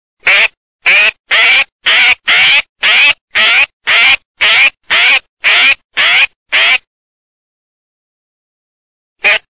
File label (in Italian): GHIANDAIA BOSCO